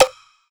skull tap; naxx

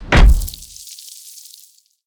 chalkboard impact sound; tavernbrawl

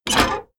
slime pipe hatch open; naxx

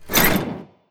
slime tank wheel; naxx

